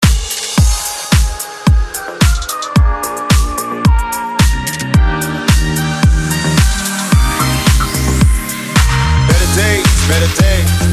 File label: 110 bpm; redrum mash up breaker